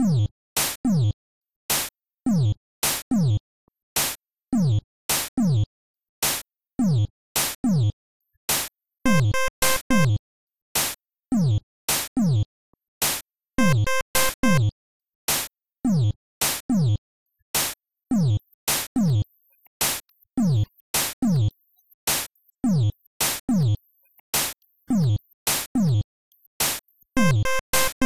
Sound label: mus menu